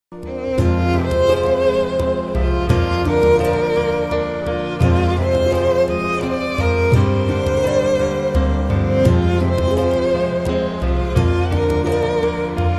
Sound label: Violin; Fate stay night